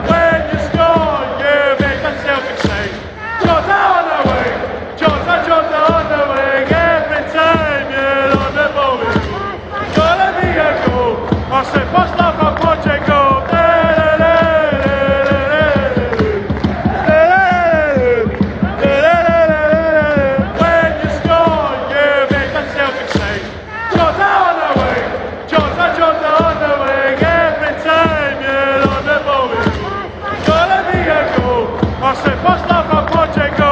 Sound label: players chant; jota on the wing; celtic